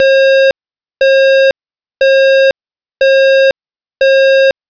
Tono Intermitente 544Hz, sirena electrónica; sirena electronica 30 sonidos seleccionables; 12-24v cca

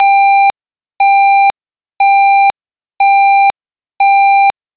Tono Intermitente 800Hz, sirena electrónica; sirena electronica 30 sonidos seleccionables

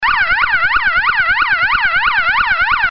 Tono WAIL rápido crec. y decrec. 650Hz - 1600Hz, sirena electrónica; 2,38 Seg.; sirena electronica 30 sonidos seleccionables; 12-24v cca

